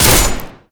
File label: attack; sven